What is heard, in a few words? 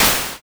splash